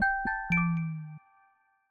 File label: Good Morning, notifications, Calm; Samsung Galaxy S26 Ultra